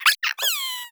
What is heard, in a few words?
vox land, creatures, snark